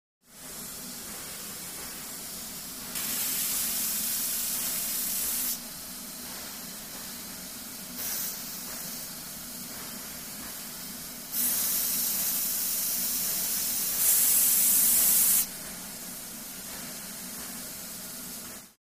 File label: Steam Release Train